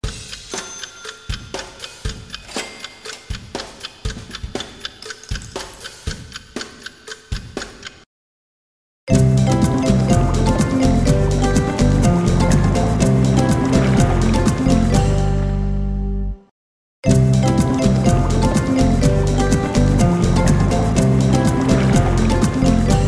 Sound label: freespin sounds; EGT; Coral Island